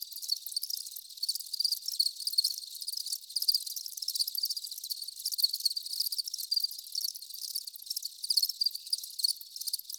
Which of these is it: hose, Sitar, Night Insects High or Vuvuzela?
Night Insects High